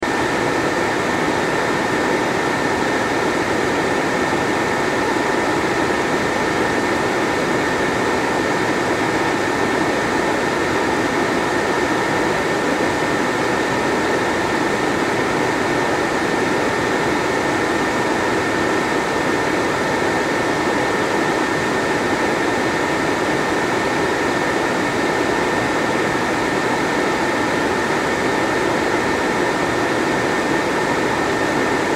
Fan Air Machine Constant Loop; Electronics; Foley; Blastwave

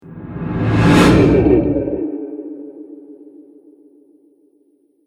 Trailer Hit Monster; Trailer Sound Effects

